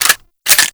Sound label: Grineer M16 Insert Clip; Weapons